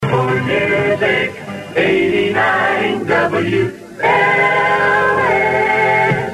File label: jingle; WLS